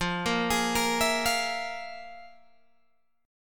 F Minor Major 7th Double Flat 5th, Guitar, Open G

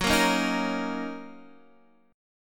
F sharp 6th, down, Guitar, DADGAD

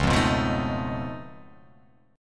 C sharp Augmented 9th, C sharp, down

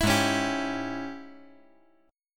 Ab Major 7th Suspended 4th Sharp 5th Ab down; strummed; JGuitar

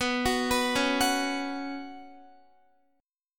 B Suspended 2nd, Guitar; Bsus2; arpeggiated; x,14,16,16,13,13

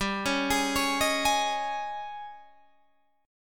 D sharp 11th G

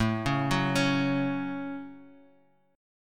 A 6th, Guitar Open F, chord; 9,8,6,8,x,x; arpeggiated

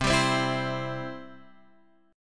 C Major, C, down, Guitar, Open F; strummed; chord; JGuitar

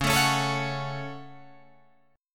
Db Suspended 4th Sharp 5th Guitar Drop D

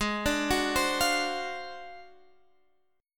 D 7th Sharp 9th Flat 5th Ab, chord

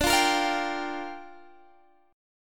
G Minor 7th, D

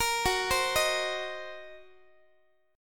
Gb 6th Flat 5th Gb, Guitar; Listen to Gb6b5 arpeggiated

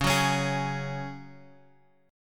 C sharp Suspended 4th C sharp; C#sus4 Chord {X 4 4 X 2 4} strummed

